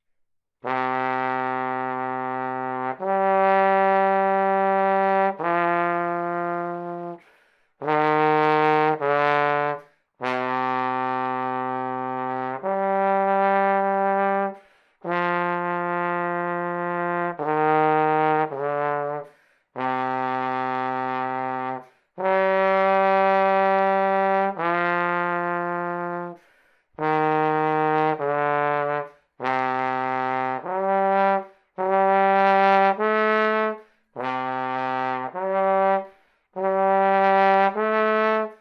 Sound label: Trombone Stems